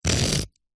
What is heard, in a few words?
foley rock or stone single scrape cut score knife; zapsplat